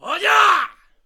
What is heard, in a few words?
Shogun Attack Vocals